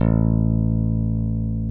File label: P-B PICK A2, BASS; Greytsounds; JAZZ+P BASS